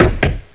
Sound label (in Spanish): boton